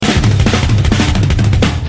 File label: Drum Solo